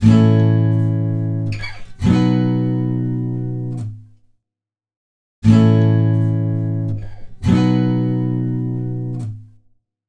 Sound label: Guitar